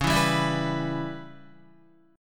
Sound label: C sharp Minor 7th Flat 5th C sharp Guitar; C#m7b5 strummed